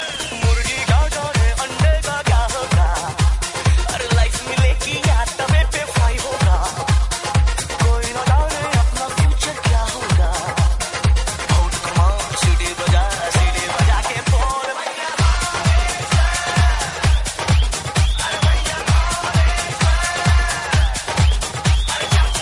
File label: Dj Remix